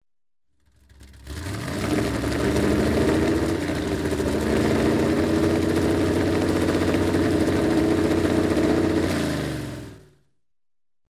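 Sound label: engine revving at races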